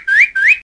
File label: cardinal; animal fun 13